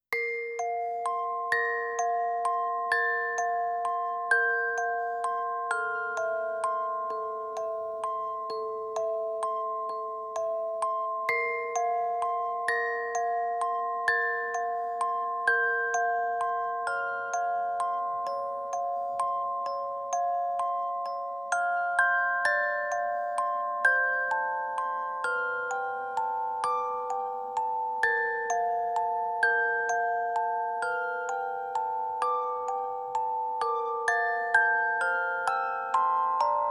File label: Orgel Ver.; Gravity Daze 2